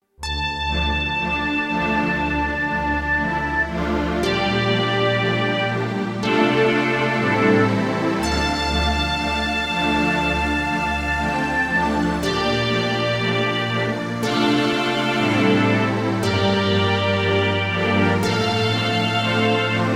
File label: symphonic saga andante con moto